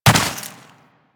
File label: Land Snow, Footsteps; Warframe; Tenno